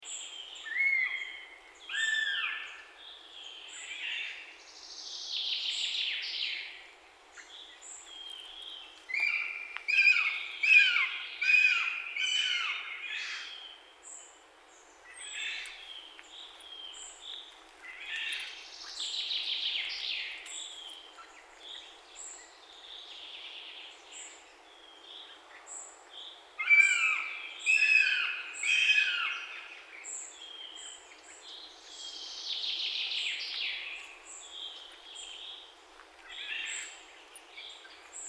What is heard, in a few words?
juv, poland; vogelstimmen; piotr szczypinski